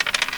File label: die